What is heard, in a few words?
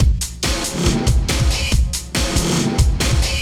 Drum, Breakbeat